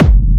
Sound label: Techno Kick; Techno Kicks; Ghosthack